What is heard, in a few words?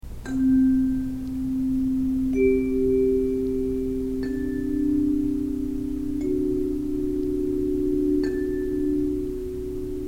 Ringing